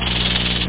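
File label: snack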